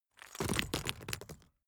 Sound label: stone drop small stones